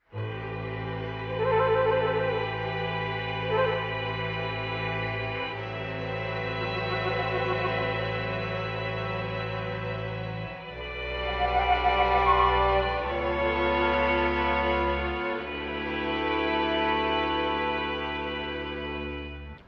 strings in desperation, Sad Loops

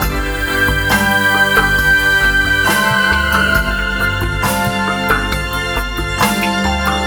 keys floating

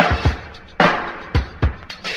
stuttering fool, drum loops, funky

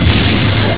crash; Crash Bang Boom

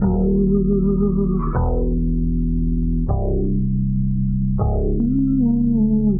keys and voice, Sad; loops

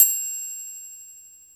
pentria, Bells; Misc Percussion

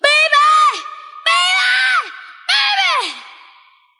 baby baby baby SUNG; Voice; ooh ahh

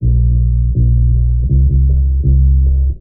bass, Loops

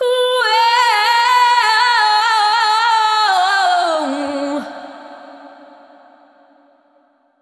"ooh yeah" SUNG

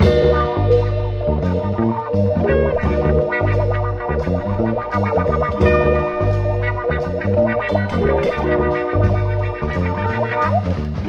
guitar, Loops

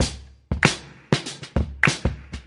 clapped hands, Boombap, Drum loops

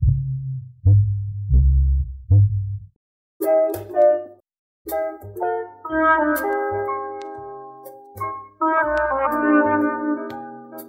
flute and piano, Loops Phonky

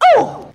"ooh", voice

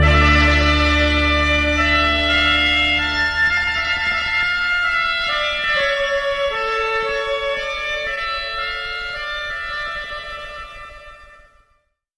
bagpipe noodles

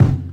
Kicks Loud

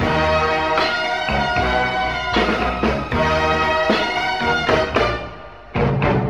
horns, Phonky loops

